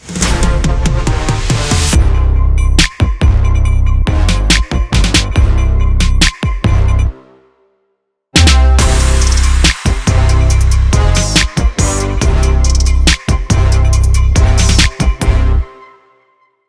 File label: hip hop